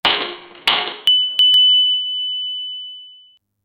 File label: old interphone sound